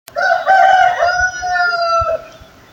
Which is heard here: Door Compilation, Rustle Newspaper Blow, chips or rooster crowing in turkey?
rooster crowing in turkey